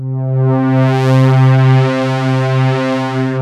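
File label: FLANGE STRING, OLD SYNTHS; Rubber Chicken Software Sample Bank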